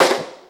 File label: snare, Real